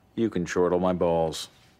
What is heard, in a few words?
chortle